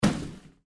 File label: reel Stop; Lucha Rumble